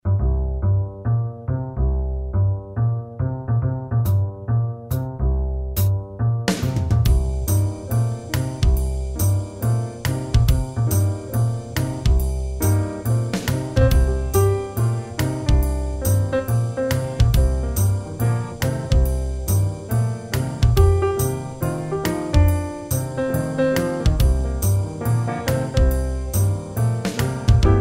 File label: Pianist